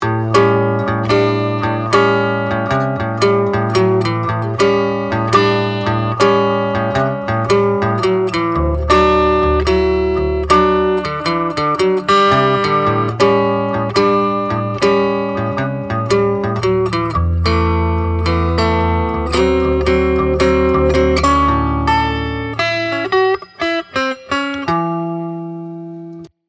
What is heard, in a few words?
Soundhole Amp